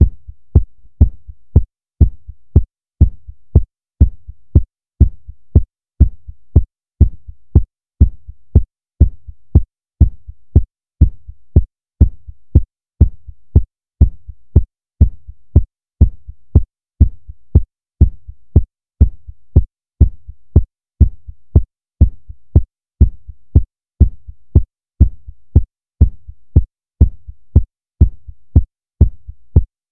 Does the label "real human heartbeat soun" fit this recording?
Yes